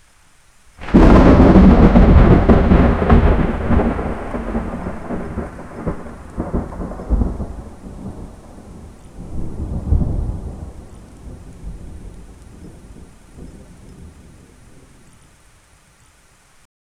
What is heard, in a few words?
sudden loud cracking thunder